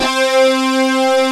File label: LINEAR SYNTH